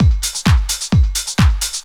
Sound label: drum 130 trance